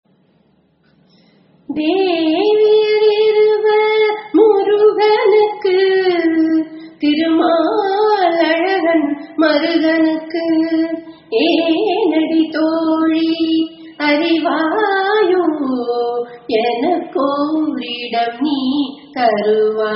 raga shri ragam cine

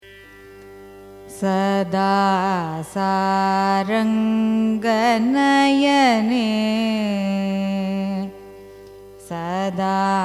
raga ranjani carnatic; carnatic music

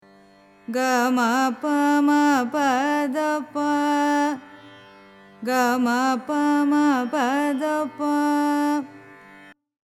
mayamalavagowlai; carnatic music

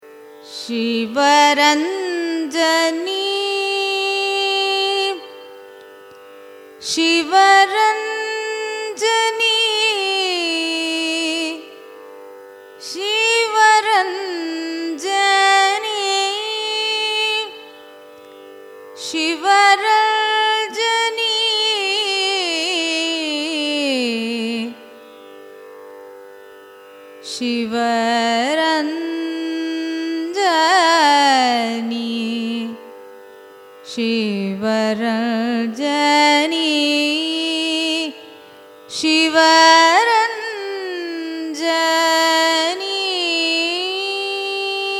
raga sivaranjani signature, carnatic music

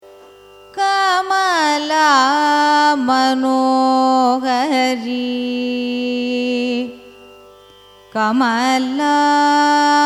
raga kamala manohari signature; carnatic music

